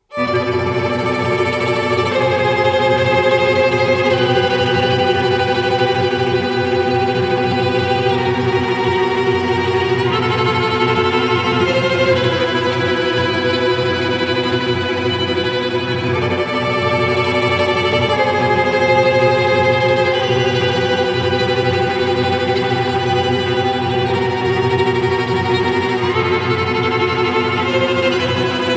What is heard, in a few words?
Warning; Jack Jeanne Original Soundtrack 2021